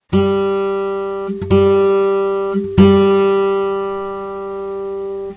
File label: Bass Guitar G String